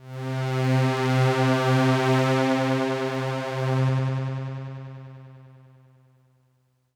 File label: Pad C, Synth Prophet One Shots; Electronisounds